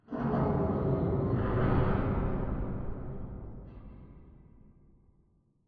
Robot Arm Pass Receiver Dist; Boomtown; Titanfall 2

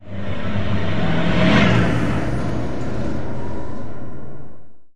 Robot Arm Track Pass By; Boomtown; Titanfall 2

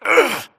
grunt hits; Titanfall 2

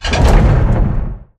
crane move start clunk; Titanfall 2

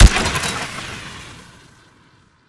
atk mech warble tail; Titanfall 2

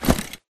Grunt Mvmt FOL Loud Short; Titanfall 2

